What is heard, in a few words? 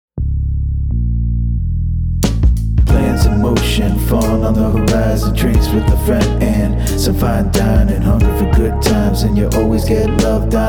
vibe remix; Little Pub